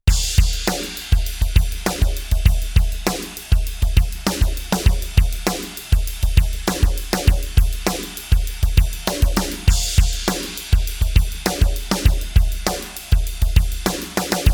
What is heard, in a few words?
drums envelope notch; universal audio moog multimode filter xl